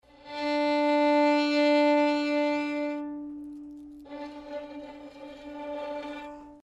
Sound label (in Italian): Legno